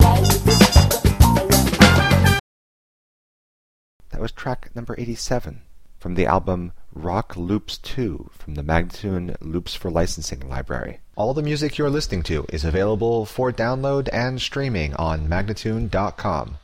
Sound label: 100 E spoken, Rock Loops; Loops For Licensing; MagnaLoops